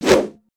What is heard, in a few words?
Staff Spinjitzu; aronwk collection; ndaudio